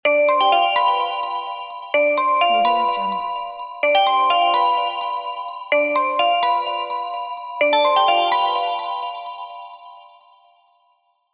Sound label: soft ringtone